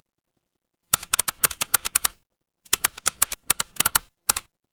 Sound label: office keyboard